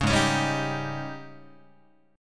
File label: G sharp Major 7th Suspended 4th, Guitar